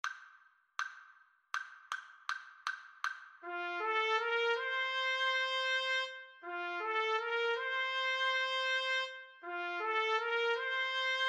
Trumpet Trombone Duet

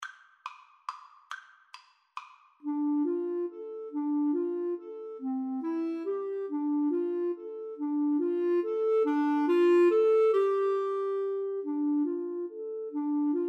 Flute Clarinet Duet

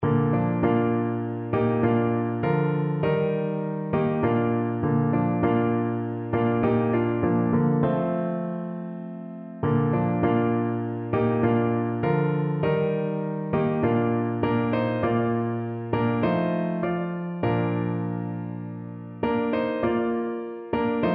there is a fountain, piano

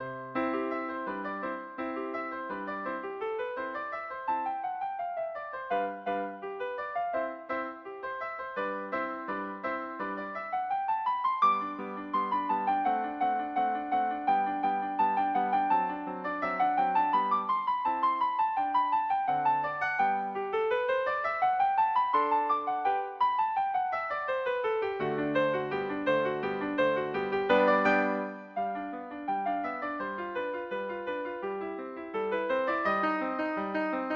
Sonatina, piano; Kuhlau

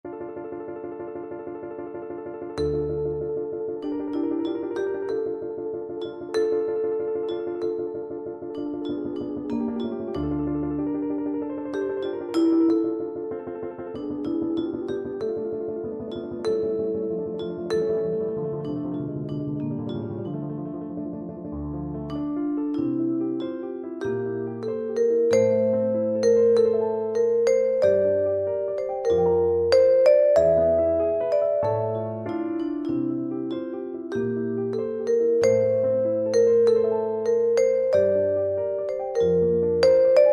scene finale, percussion

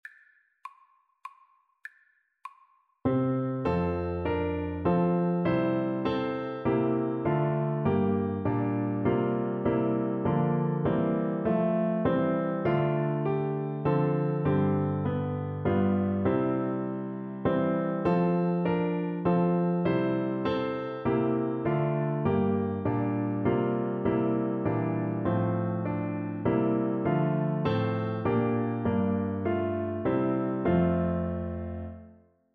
st clement, Trumpet

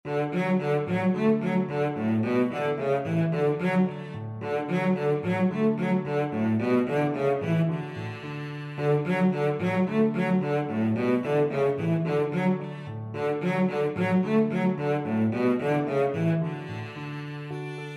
ecossaise, cello

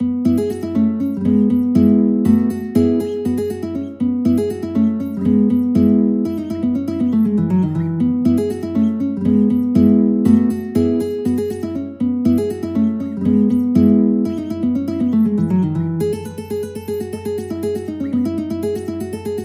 arkansas traveler guitar

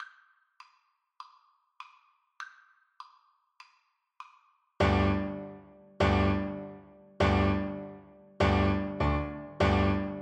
Cello

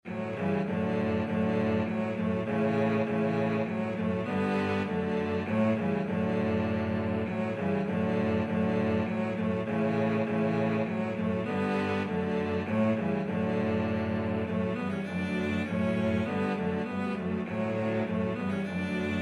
come thou fount, cello trio

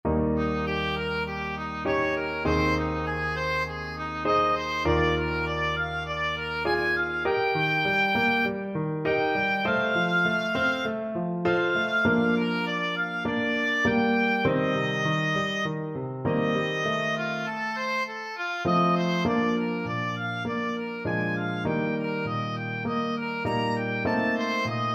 bach, oboe

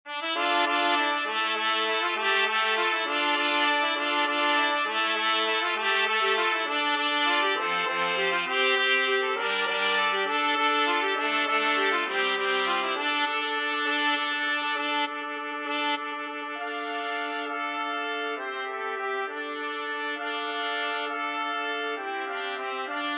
pirates 4TPT, trumpet quartet

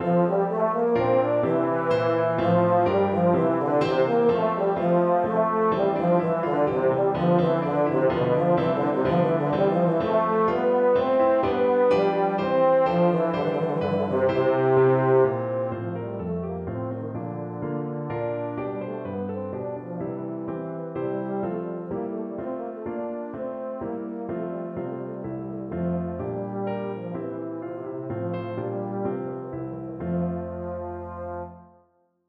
EUPH, euphonium

